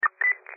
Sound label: Vertex DOS